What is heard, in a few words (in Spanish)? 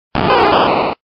Grito de Bulbasaur